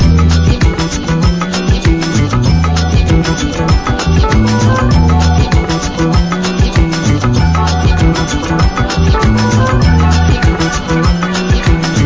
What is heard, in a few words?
random loop